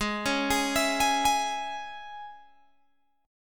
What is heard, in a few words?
G sharp Suspended 4th Sharp 5th G sharp, Guitar Open G; 18,18,18,21,21,18